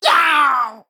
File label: Male Imp Death